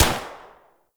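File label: Assault Rifle Fire Shot; Warframe; Weapons; Tenno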